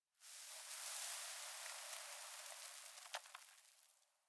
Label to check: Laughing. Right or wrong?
Wrong